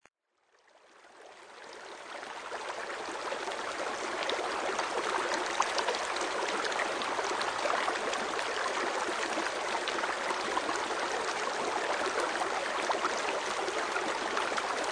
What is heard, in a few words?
Dancing Water